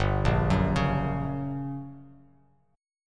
G Minor Major 7th Flat 5th G, Bass Standard; arpeggiated; JGuitar

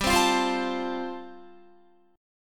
G 9th Suspended 4th, Guitar; Listen to G9sus4 strummed; G9sus4 Chord

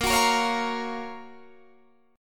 F sharp Major 7th

